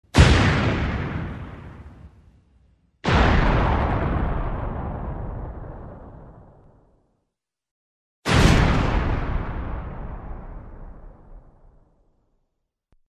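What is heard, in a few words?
Series of three explosions; Звук взрыва от бомбы или снаряда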